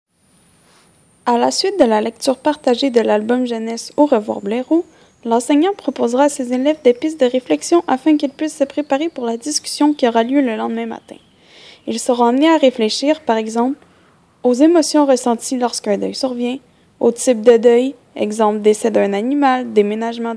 Vocal

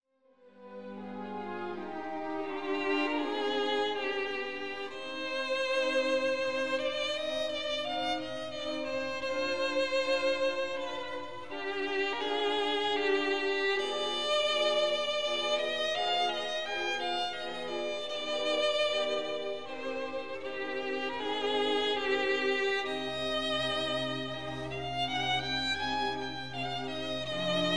violin

